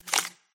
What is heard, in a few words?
card flip